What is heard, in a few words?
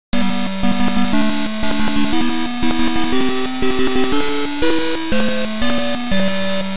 Jingle; verze se čtyřhlasým přehrávačem a simulací obálky tónu